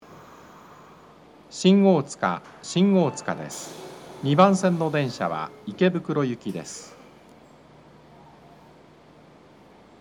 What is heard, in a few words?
melody; shin otsuka; 到着放送＋; 発車サイン音; 丸ノ内線 池袋ゆき